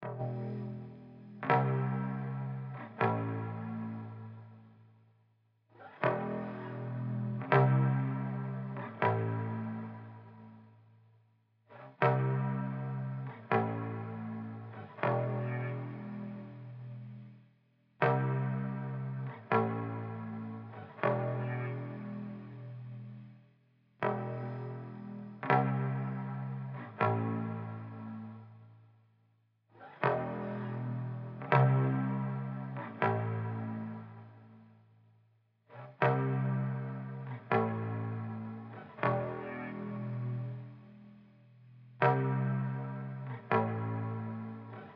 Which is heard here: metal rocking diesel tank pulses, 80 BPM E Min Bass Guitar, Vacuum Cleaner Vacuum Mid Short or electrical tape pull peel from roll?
80 BPM E Min Bass Guitar